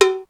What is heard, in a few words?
bell; E-MU Formula 4000 Series Vol. 1; Hip Hop Nation; Bonus Perk